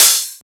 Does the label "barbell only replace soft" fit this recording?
No